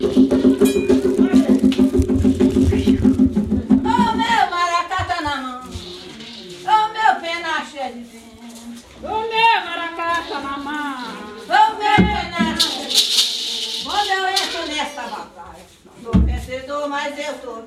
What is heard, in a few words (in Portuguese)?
terreiro de xango meu maraca